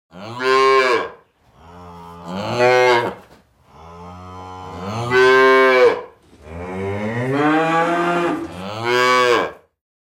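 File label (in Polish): odgłos zwierząt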